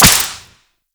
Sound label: Whip Impact Default; Warframe; Grineer